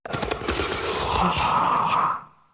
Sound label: gorefast idle; kf2; gorefiend; slender